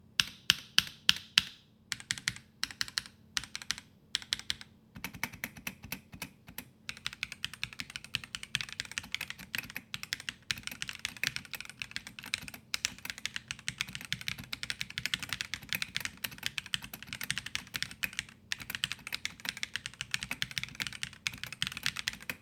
jelly75, keyboard; asus jelly75 keyboard kd201

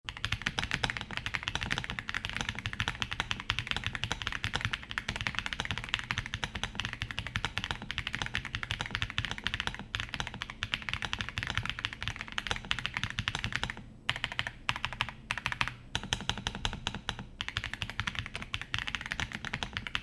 redragon keyboard audio; redragon artemis k719 pro anime keyboard

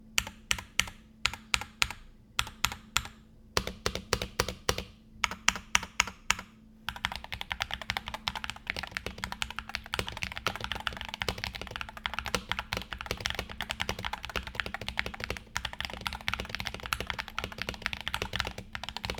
rog falchion ace 75 he sound test, keyboard; asus rog falchion ace 75 he keyboard

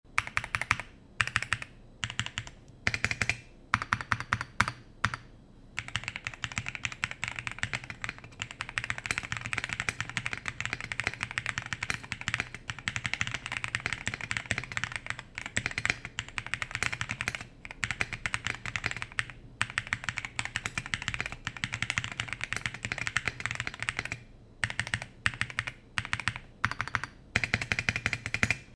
womier wd75, mechanical keyboard

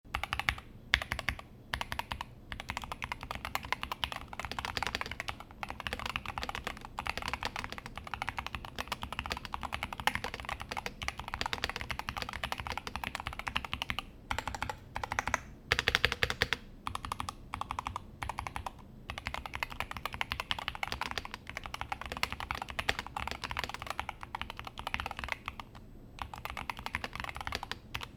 keychron k8 he, keyboard; keychron k8 he wireless special edition keyboard

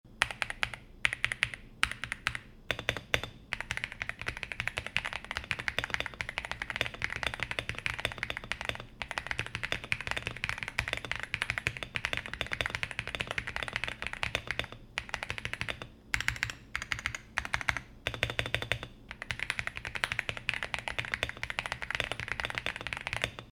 gravastar keyboard; gravastar mercury k1 pro gaming keyboard

